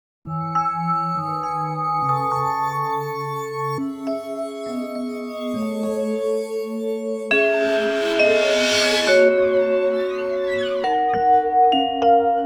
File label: Guided Vocals